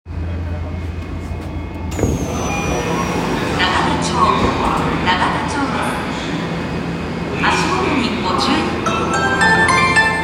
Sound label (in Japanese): 有楽町線永田町東, train sounds